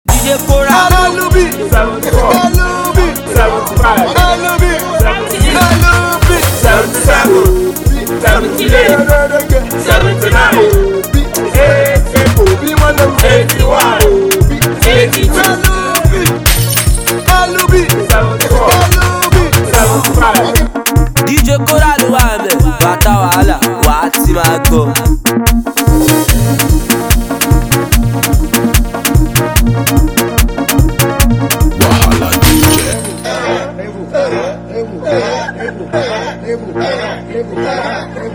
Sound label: Dance Beat